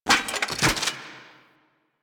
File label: Grineer Crew Ship Pilot Finisher Land; Warframe; Rail Jack